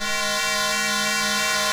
Synth; Blu Mar Ten; Jungle Jungle - 1989 to 1999 Samplepack

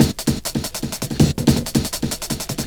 Control Break, Jungle Breaks; Blu Mar Ten - Jungle Jungle - 1989 to 1999 Samplepack

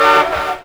Hardcore Hit; Jungle; Riffs, Arps & Hits; Blu Mar Ten; Jungle - 1989 to 1999

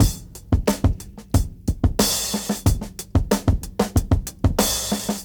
Breakbeats

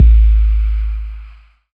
Calm Bass - 8A; Jungle; Blu Mar Ten; Jungle - 1989 to 1999

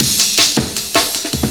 Intro Amen; Jungle; Breaks; Blu Mar Ten - Jungle Jungle - 1989 to 1999 Samplepack

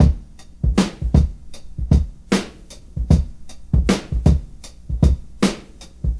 Breakbeats

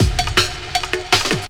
Travelling Break, Breaks, Jungle; Blu Mar Ten - Jungle Jungle - 1989 to 1999 Samplepack

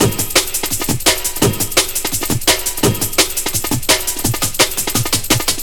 Break; Jungle; Breaks; Blu Mar Ten - Jungle Jungle - 1989 to 1999 Samplepack

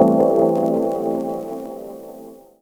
Different Chord - 8A, Jungle Riffs, Arps & Hits; Blu Mar Ten - Jungle Jungle - 1989 to 1999 Samplepack

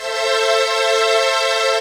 Sweet Pad; Jungle; Pads; Blu Mar Ten; Jungle - 1989 to 1999

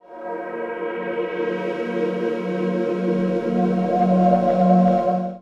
Rise - 2A, Jungle; Riffs, Arps & Hits; Blu Mar Ten; Jungle - 1989 to 1999

